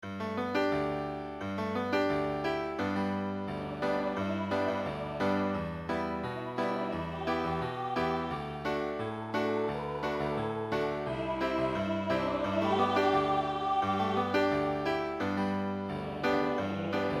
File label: midi